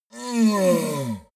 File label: yeti call painful